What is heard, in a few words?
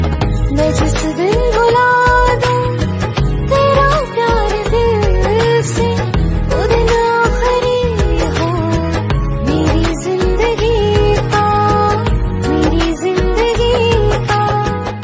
Female Version Ringtone